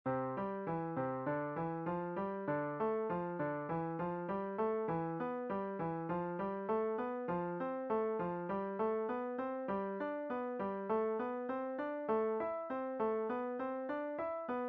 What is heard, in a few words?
Five finger step & skip, piano exercises